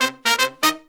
Pop & Funk Brass

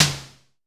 Drum X Snare; Pearl Drum-X

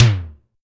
SIMMONS SD-5 drums

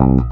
Single Acoustic Basses

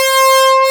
PWM

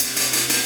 Cymbol Particle; Particles

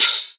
Open HH, Cymbals

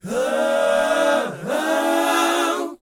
BOYZ, Vocal Planet, R&B Dance

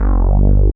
SYN TECHN, dance synth; Sound & Vision - Gigapack I CD 2

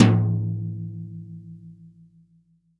TOM, REAL DRUMS, SWING KIT

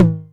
Tom Hi, Novation Drumstation

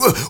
MALE SOLO, MALE NOISE, Heart of Africa

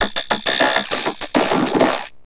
Vocobeat; Sonic Foundry (Sony Creative Software)

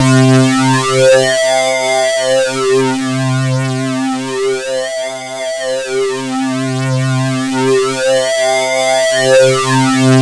JV CLAS LEAD; Syntec; Wall of Sounds

